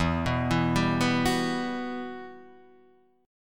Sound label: E Minor Add 9th; madd9:1,b3,(5),9